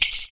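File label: PERC, percussions; Univers Sons - Raricussions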